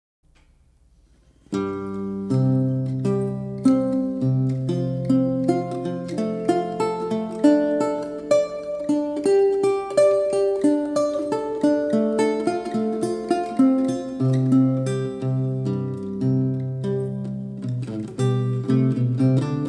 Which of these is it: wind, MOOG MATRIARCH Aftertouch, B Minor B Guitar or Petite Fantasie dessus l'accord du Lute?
Petite Fantasie dessus l'accord du Lute